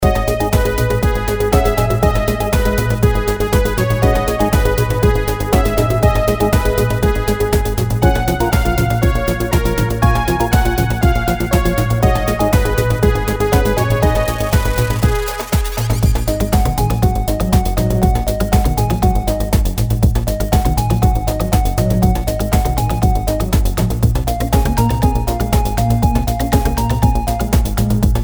marching electronics

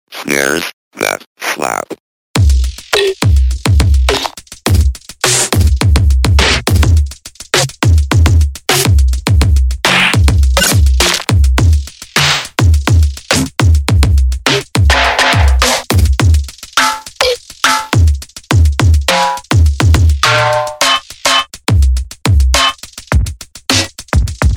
snares that slap

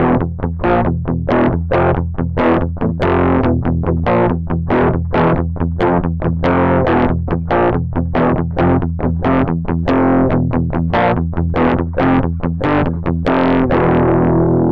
metal

